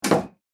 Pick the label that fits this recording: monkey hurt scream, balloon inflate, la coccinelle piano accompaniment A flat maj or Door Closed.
Door Closed